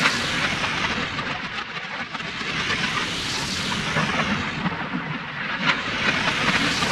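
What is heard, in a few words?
projectile loop; Titanfall 2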